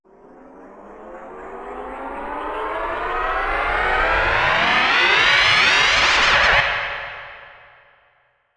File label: Sweeps Long